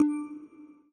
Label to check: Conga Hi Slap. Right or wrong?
Wrong